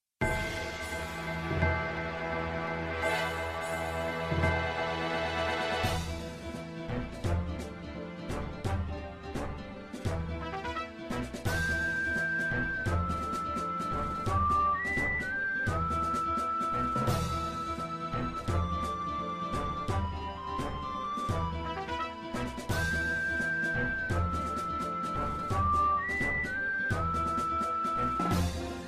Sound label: battle, ambience music; PhoenixCraft 1.16.5